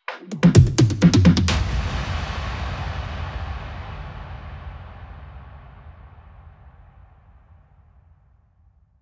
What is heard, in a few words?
Big Fill Ins 128BPM; Vengeance Samples